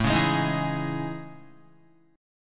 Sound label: A Major Sharp 11th, Guitar; AM#11; strummed; JGuitar